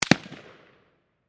Gun Machine Gun, Gun, Machine, Gun, .223, Caliber, Single, Shot, Distant, 100, Feet, Towards, Bushmaster, Ar-15; Warfare